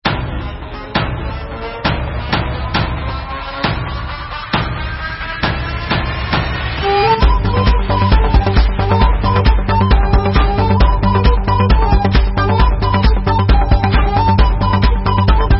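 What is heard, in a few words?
instrumental, Israeli Dances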